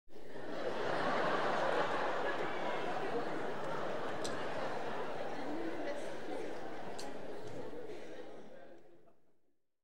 Theatre And Concert Audiences - Continuous Laughter In Theatre; Human Crowds, Children & Footsteps